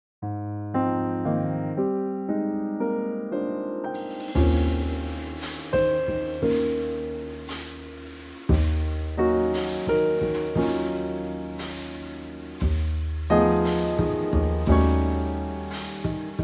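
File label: backing track; key Ab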